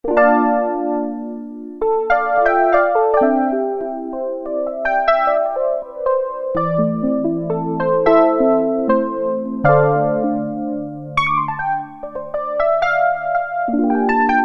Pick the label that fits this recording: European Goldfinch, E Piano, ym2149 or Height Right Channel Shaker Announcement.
E Piano